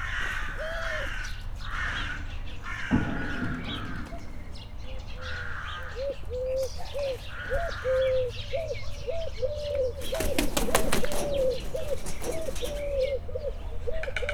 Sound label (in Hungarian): vetési varjú gerle fehér gólya egerészölyv; Hortobágyi Nemzeti Park